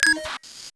health charge yes

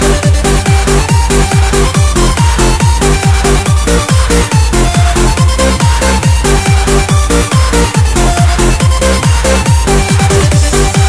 bass; chatsounds

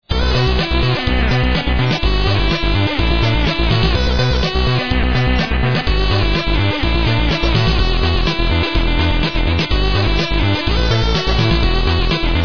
song; sven

